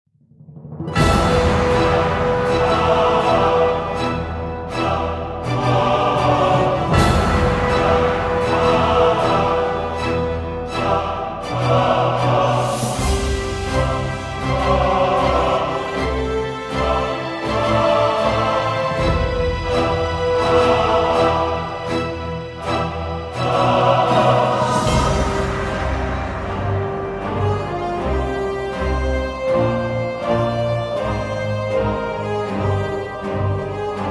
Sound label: Menu Music